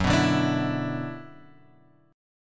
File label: F sharp 7th Suspended 4th Sharp 5th; F#7sus4#5 Chord {2 2 0 X 3 0} strummed